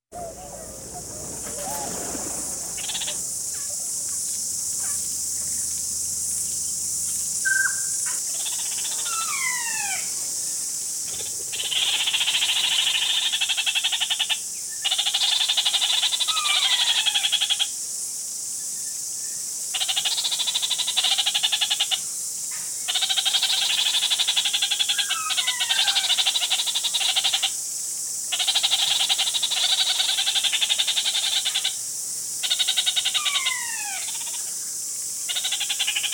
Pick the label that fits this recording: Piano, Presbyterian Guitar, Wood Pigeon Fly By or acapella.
Wood Pigeon Fly By